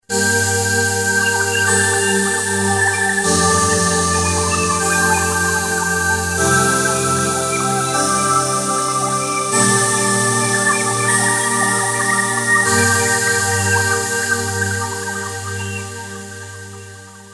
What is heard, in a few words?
deep f, synth roland jd800; card demo